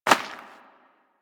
Rodent Hop Step Rear Dirt, Forest Rodent Footsteps; Warframe